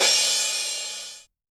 crash, Drum Machines, Korg ER-1; Kit 18

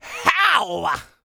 YELL, MALE HUMAN; ILIO; Vocal Planet

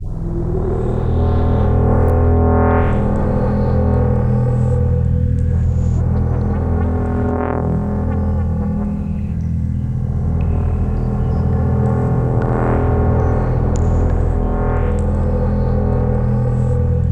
CARGO HOLD; East West - Twisted Textures-BT CD2

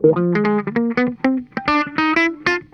NOTE, Funk Guitar; Zero G - Funk Guitar

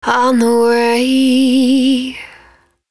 110bpm Ab, Velvet Vocal; Zero-G

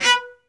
SOLO CE, Strings; Synclavier; ILIO

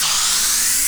noise fx; Masterbits; Switch FX

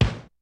kicks snare, kid; Kid Nepro; Elite; KICKS SNARES

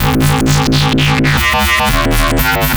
Popwow Bass, Drum n Bass

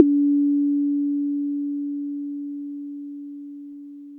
Tom Syn Hi, Korg Toms; Korg 05R-W

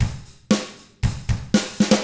ROCK

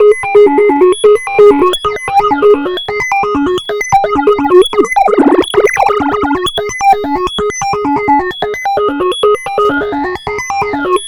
Lead

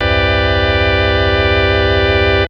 vox, dx7

